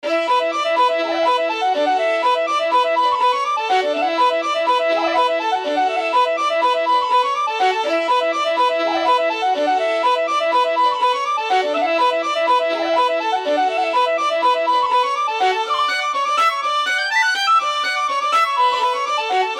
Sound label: VIOLIN Whistle